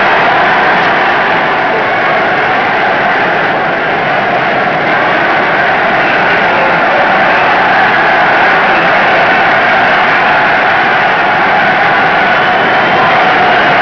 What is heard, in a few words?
crowd; colosseum